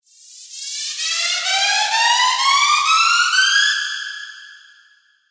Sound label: Uplifter; SERUM FX